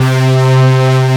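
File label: PWM brass C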